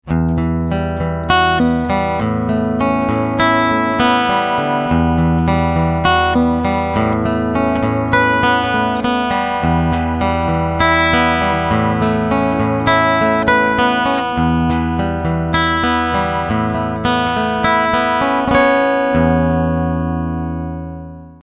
Soft Picking Cool Tube

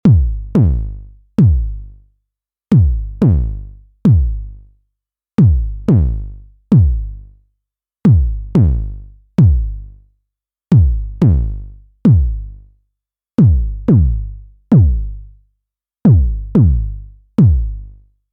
BD Overload

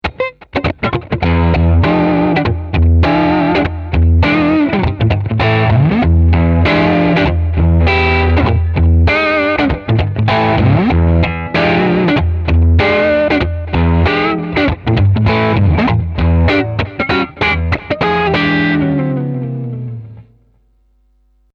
Crunch Neck

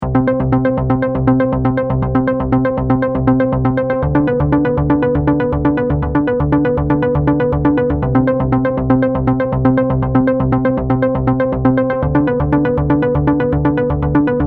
Soft Synth Arp; EQP Bypass Mode

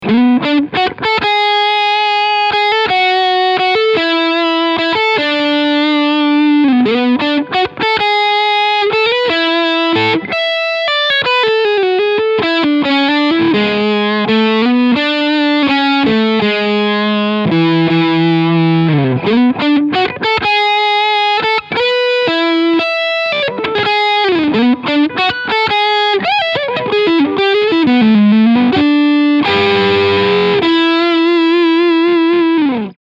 Lick Sweetspot

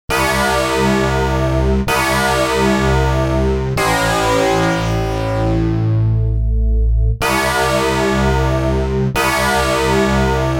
Metalsync Bass

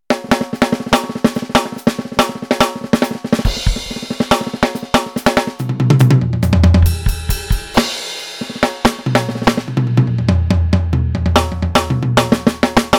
EFNOTE5 Kit 4

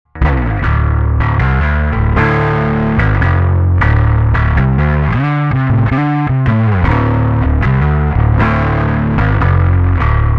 Alpha Omega mix bite bass mid boost

